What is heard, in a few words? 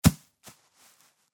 ball drop in grass